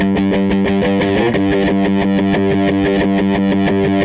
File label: Les Guitar Loop